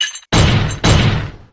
double slam; blackquill

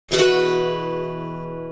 tune up riff

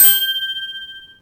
lightbulb

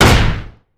face desk; apollo

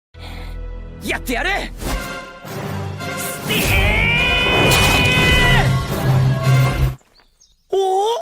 steal; kazuma

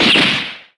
gunshot

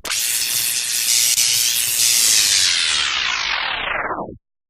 bzzt

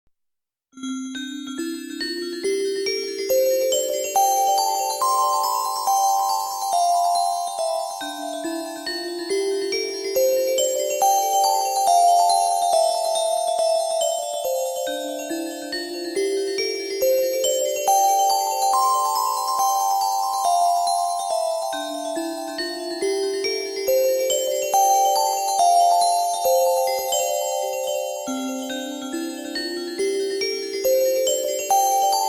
quiet voice; fsn

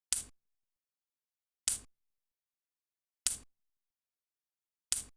coin toss; retinz

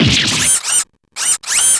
damage goggles; tonate

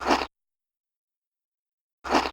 eat

